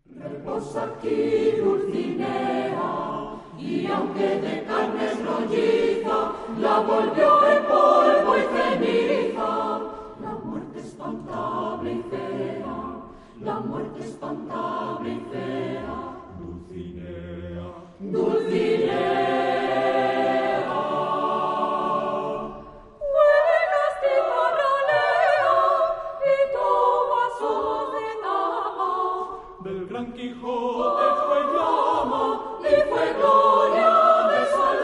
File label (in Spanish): coro; coro ateneo de pozuelo; 2a Bienal Coro Mixto Pena Santa